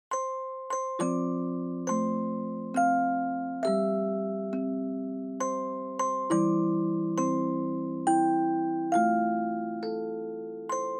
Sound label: Dry Slow; bgm; Happy Birthday To You